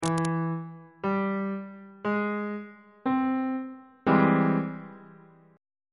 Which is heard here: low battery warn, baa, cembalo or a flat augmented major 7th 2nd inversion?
a flat augmented major 7th 2nd inversion